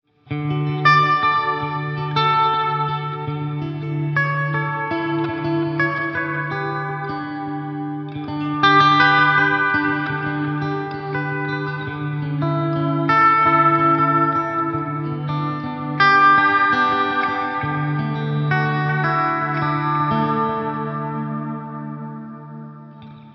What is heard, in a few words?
Line6 DL4 MKII Delay